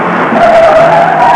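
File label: skidding, transportation; Web Clip Empire 150,000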